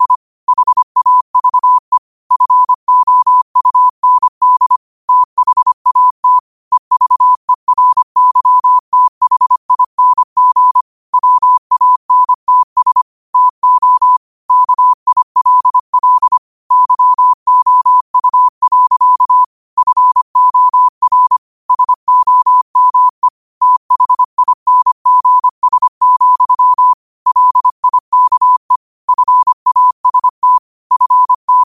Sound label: 5 wpm, morse